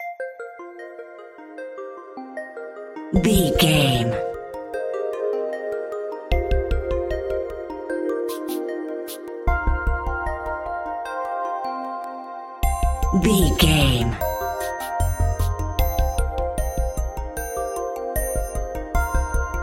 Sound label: detective work narrative